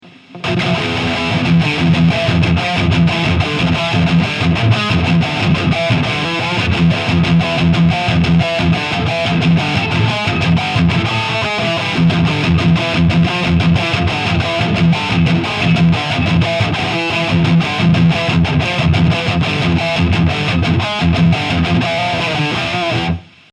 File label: Diezel Red Channel